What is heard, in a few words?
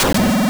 Wing Dungeon Collapse; OOA; Zelda; Oracle